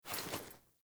Foley Action Default Unarmed Parry, Foley Default Clothing; Characters; Player